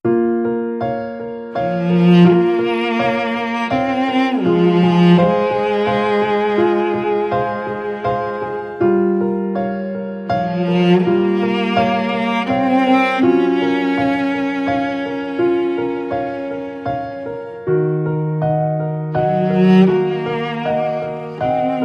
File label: Intimate